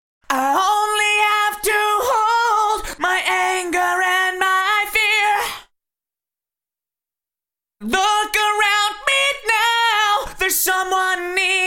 Vocals Yamaha Rev7 Symphonic; Vintage Digital

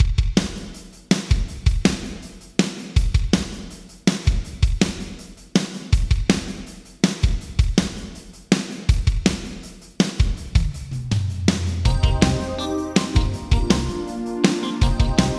Drums Yamaha Rev7 Large Hall PD8ms 1.2s; Vintage Digital

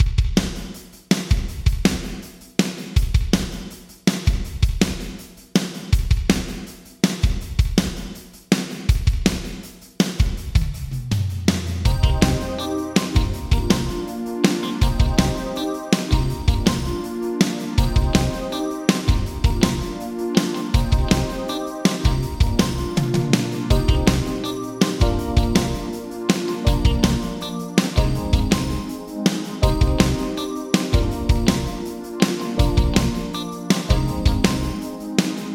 Drums Yamaha ProR3 Medium Hall PD17ms 0.9s; Vintage Digital

